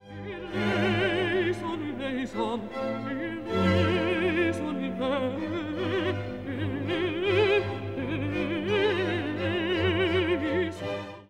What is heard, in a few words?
Christe Alto